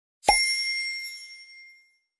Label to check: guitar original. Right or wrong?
Wrong